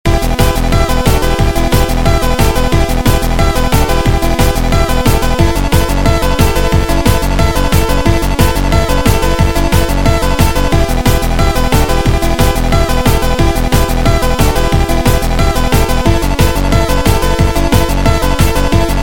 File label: music; hexagon glitch 3